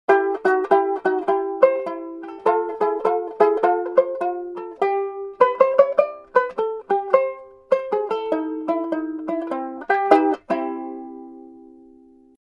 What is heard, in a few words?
BANJO UKULELE